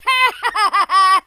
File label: laugh, Drill Sergeant; speech